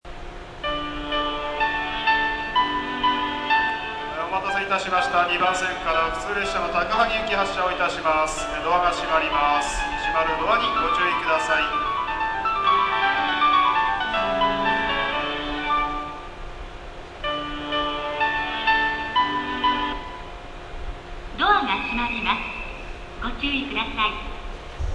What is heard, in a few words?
tsuchiura melody; jreast